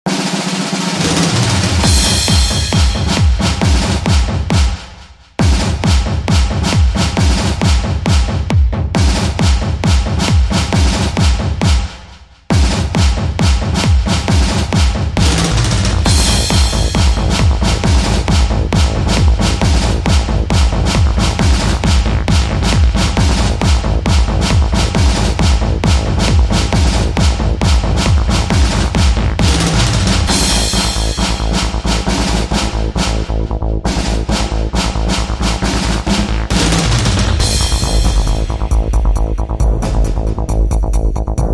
Slammin Drum Mix

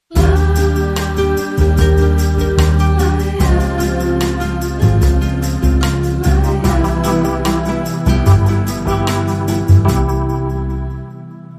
Dreamy Bossa, Sting

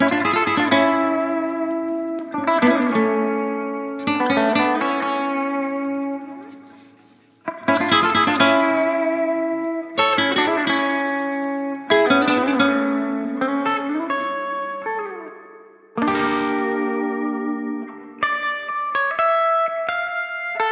Bolero Mexicano, Guitar Only

